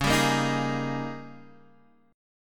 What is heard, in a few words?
D sharp 11th